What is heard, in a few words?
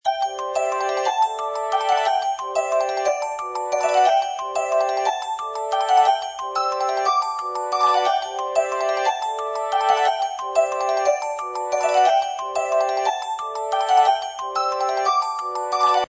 Ringtone; W510 Twiggy